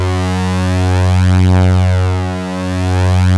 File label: OB F#3 -L, Rare Synthesizer; Hollywood Edge - Giorgio Moroder Rare Synthesizer Collection; OB 4 VOX.